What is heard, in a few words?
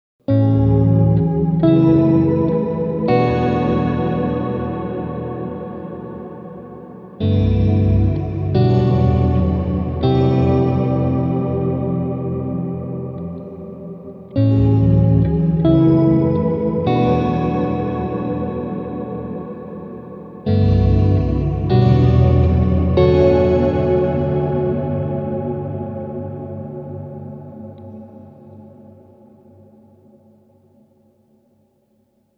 shimmer; bigsky